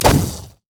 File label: sad mummy bandage toss; LoL; amumu